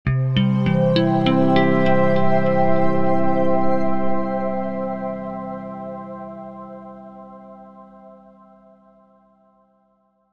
multimedia musical ident signature corporate acsending plucked